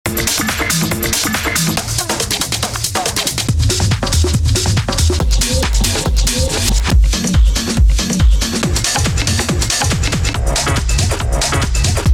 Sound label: Hardgroove Pressure Loops